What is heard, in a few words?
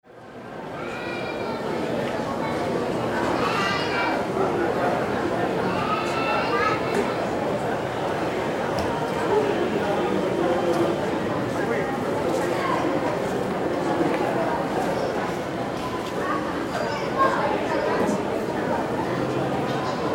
pedestrian zone in bogota